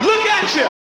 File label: Chant